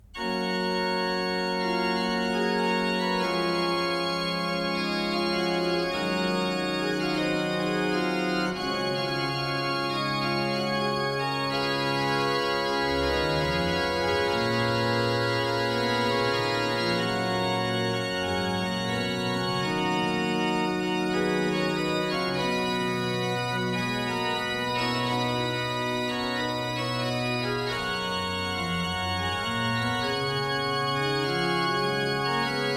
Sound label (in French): orgue